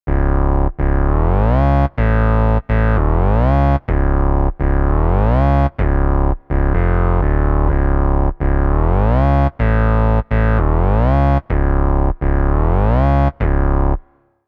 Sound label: synth bass tube; saturator x